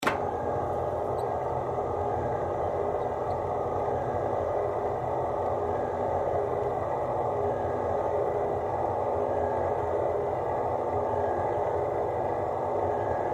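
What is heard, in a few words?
Projector Screen close up Up Long Steady; Offices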